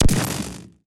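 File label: elec wand, impact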